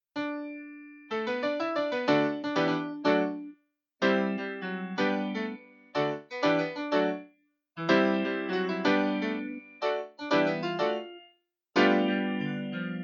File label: audition accompaniment; donkey